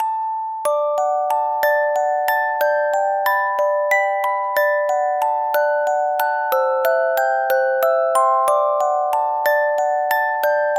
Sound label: musicbox berceuse, 6拍子